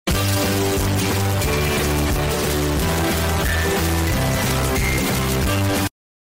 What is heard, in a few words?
lobby music; bloxburg